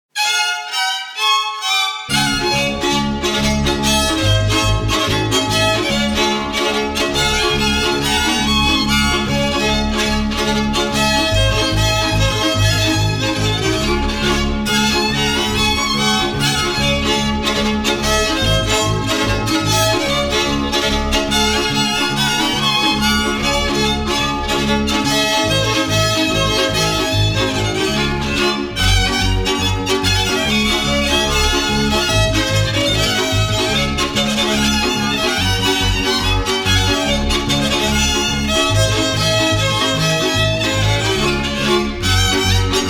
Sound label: Polka